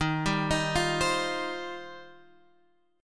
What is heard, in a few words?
D sharp 6th Add 9th, Guitar, Standard; arpeggiated; D#6add9; 11,10,13,10,13,x; JGuitar